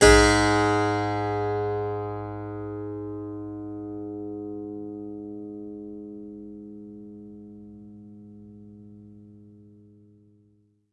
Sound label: G#3, Synclavier keys and guitars; Sampler Library